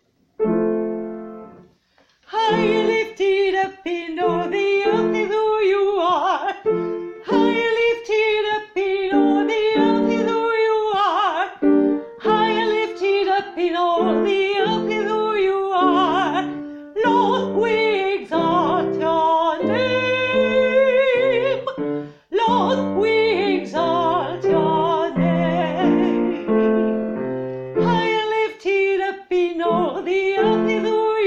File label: High and lifted sopr